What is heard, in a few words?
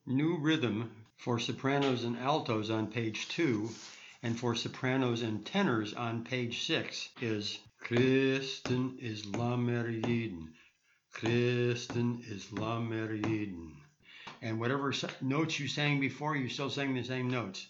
Rhythm; Brudershaft; Rhythm pg 2 Soprano & Alto; pg 6 Alto & Tenor